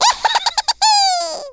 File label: laugh, Russian